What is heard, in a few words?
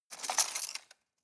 cutlery plastic multiple drawer grab